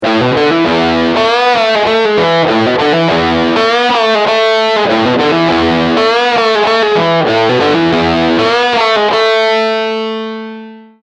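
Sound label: New Clipper, modified version, guitar; Guitar - effect - Bulldog Cab Sim - sound card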